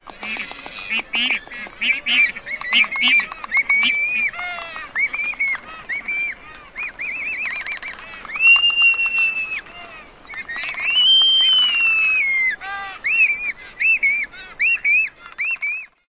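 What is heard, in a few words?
Black-footed Albatross social calls and clicking; MIDWAY ISL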